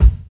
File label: dry kick sample a sharp key, kickdrum tuned to the A# note. Loudest frequency: 137Hz